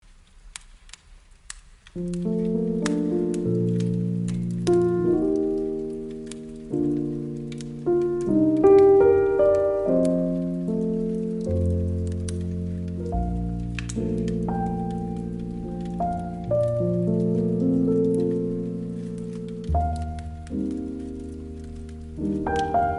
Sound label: Snowfall Lullaby